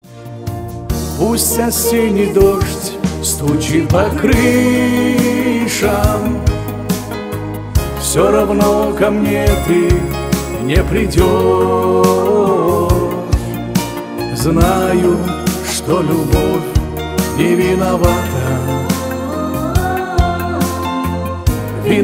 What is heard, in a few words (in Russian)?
шансон